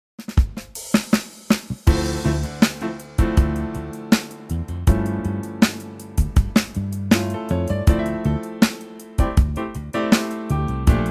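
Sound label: funky trio D minor; key - Dm - vocal range - E to Bb; Superbly funky Trio arrangement of this great theme from the 70's classic movie "Love Story"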